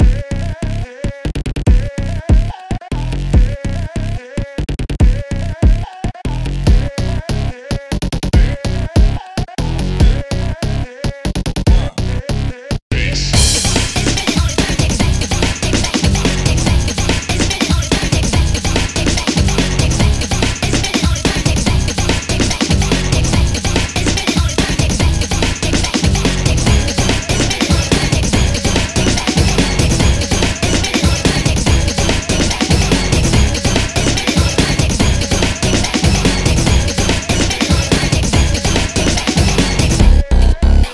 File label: Instrumental; Bounce; Friday Night Funkin' Fun is Infinite OST mod Windows gamerip 2022